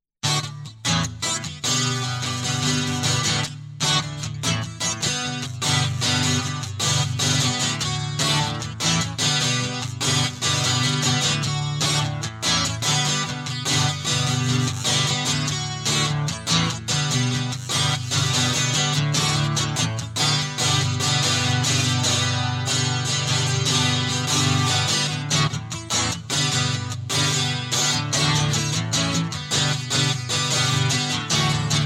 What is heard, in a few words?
Guitar Loop